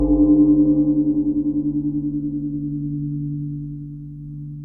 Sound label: distortion bell; carmen